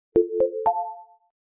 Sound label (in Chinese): 点击